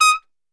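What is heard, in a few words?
D#4 trumpet shot, Brass Super Section; Best Service; TRP SHOT; 90 s Sample CDs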